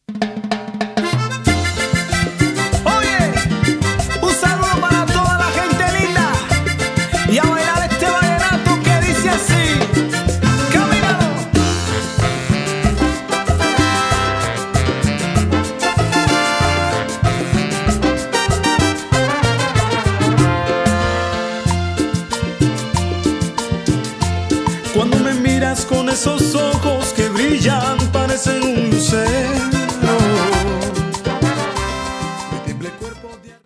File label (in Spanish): Salsa